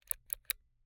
Camera, Kinusa K77, 50mm lens, plastic, serrated, lens adjust, slow; Eiravaein Works; Vaeyan IV